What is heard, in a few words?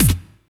BD, Drum & Bass, 170 BPM; Ueberschall; Drum & Bass Flash Trackz